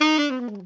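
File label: ALTO short fall D#3, Sax; Giga Samples Collection; ALTO SHTFALL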